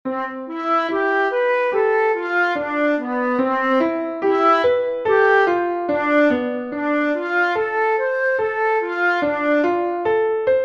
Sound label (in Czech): duet